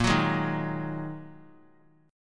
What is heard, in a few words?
Bb 7th Suspended 4th Sharp 5th Bb 3C,3F,3A,3D; Bb7sus4#5; strummed; 22,25,23,25; JGuitar